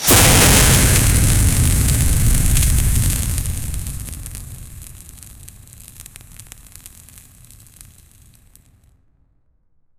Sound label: blend the lightning impac, attack